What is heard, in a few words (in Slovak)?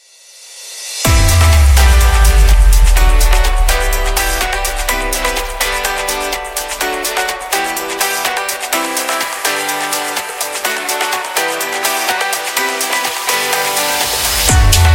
hudba; videa do aplikacie